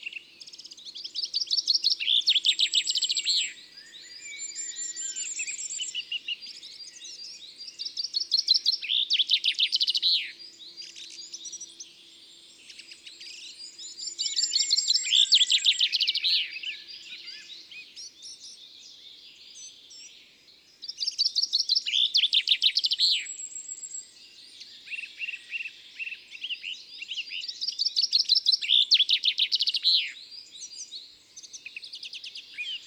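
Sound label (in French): Pinson des arbres, Concerts naturels de l'Isère; Studio Les Trois Becs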